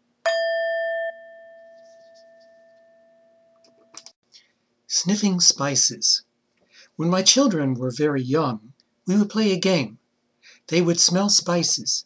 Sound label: Sniffing Spices